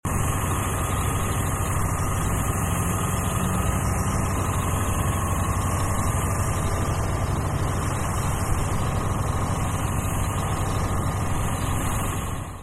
hochdruckreinigung des hochstrahlbrunnens

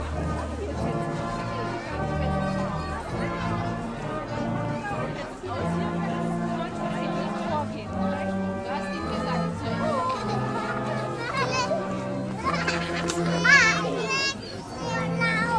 kindermusikchor

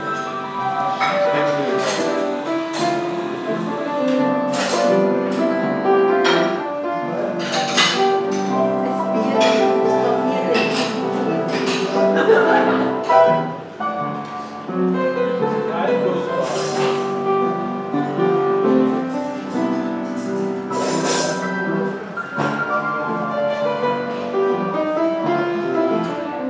klavier; Pierre Victoire

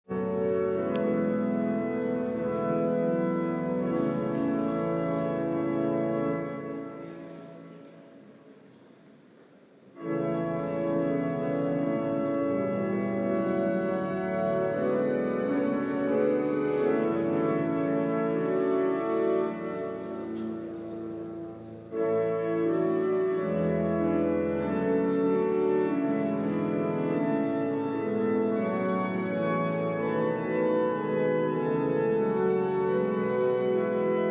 Orgelei

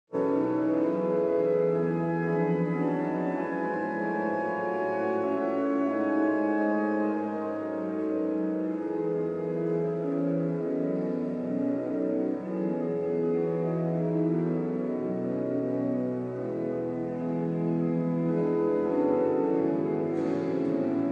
Orgelmusik in der Kathedrale

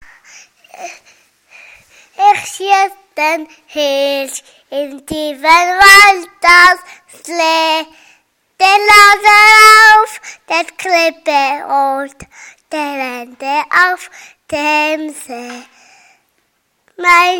ich schiess den hirsch gesungen; jagd

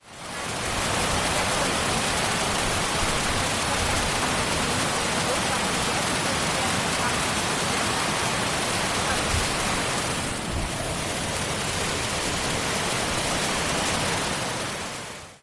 Bachquerung am Weg zur Geraerhütte

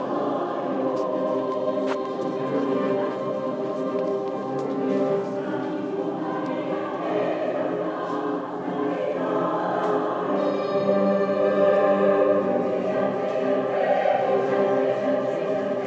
japanischer chor

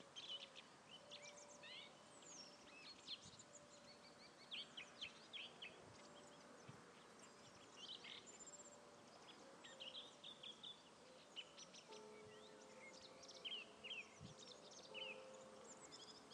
Vogelgezwitscher am Eulenberg

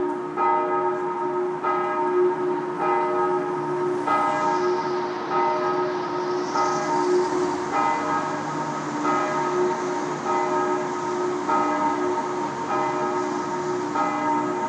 Glocken der Heiliggeistkirche Schweinfurt

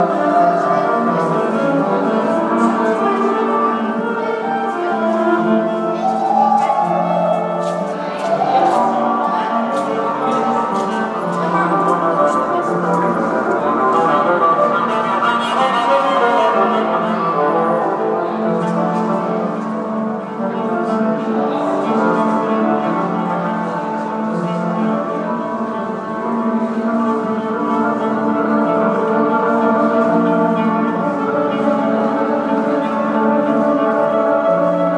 cello musik

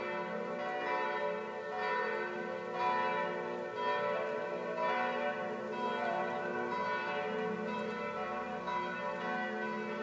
Freitagsgeläut

